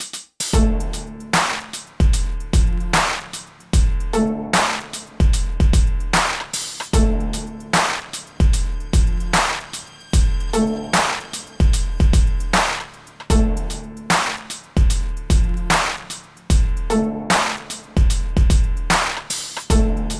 Rap Loop; The Jackbox Party Pack 5; Jackbox Games

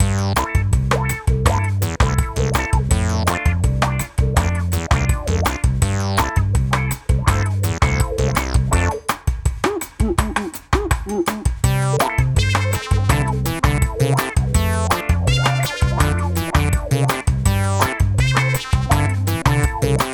Draw Music; Jackbox Games; The Jackbox Party Pack 1; DRAWFUL

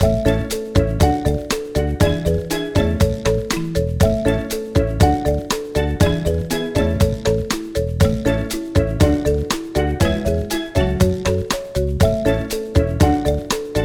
Scoreboard; The Jackbox Party Pack 6; Jackbox Games

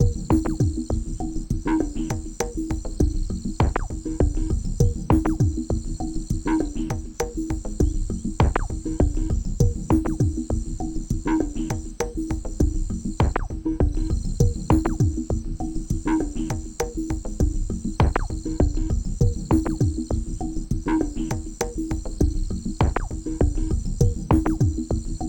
Background Loop

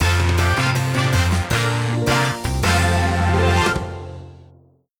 Round 1 Bumper; Fibbage 3; Jackbox Games; The Jackbox Party Pack 4

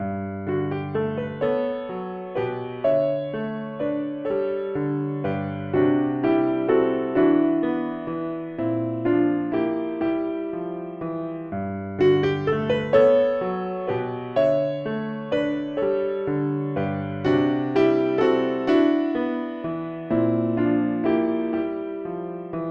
Draw Music; Bidiots; Jackbox Games; The Jackbox Party Pack 2

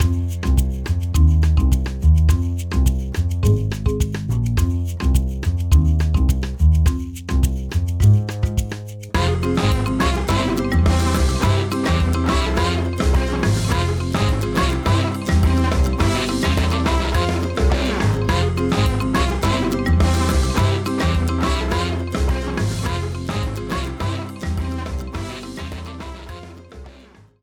Ask Countdown Music; Jackbox Games; The Jackbox Party Pack 1; YDKJ

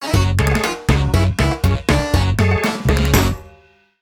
Round 1 Bumper; Fibbage 2; Jackbox Games; The Jackbox Party Pack 2

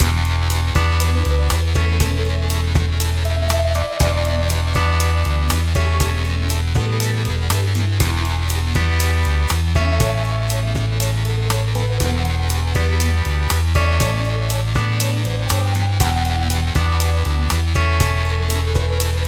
Round 3 Background; Fibbage 3; Jackbox Games; The Jackbox Party Pack 4

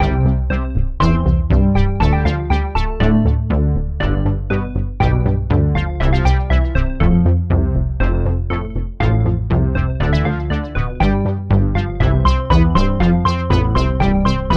Choose Music; Earwax; Jackbox Games; The Jackbox Party Pack 2

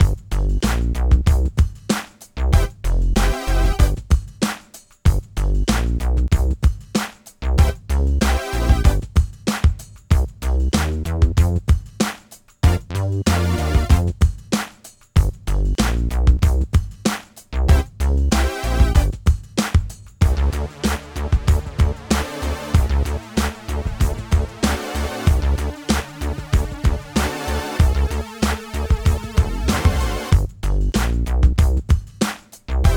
Scoreboard; Monster Seeking Monster; Jackbox Games; The Jackbox Party Pack 4

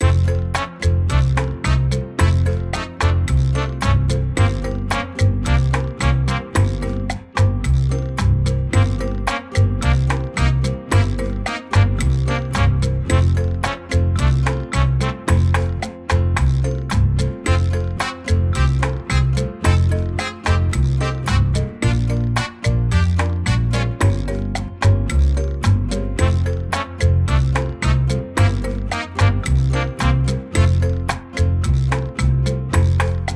Lobby Music; Jackbox Games; The Jackbox Party Pack 1; FIBBAGE XL

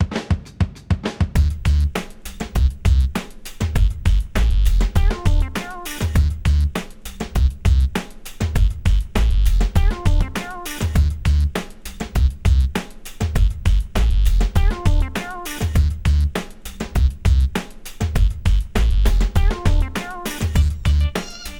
Write Loop; The Jackbox Party Pack 5; Jackbox Games

